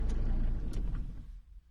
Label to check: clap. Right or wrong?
Wrong